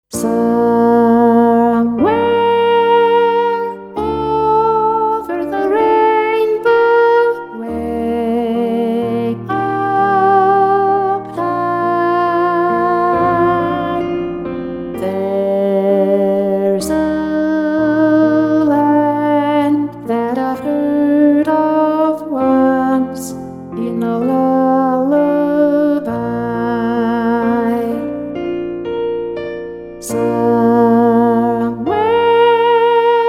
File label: piano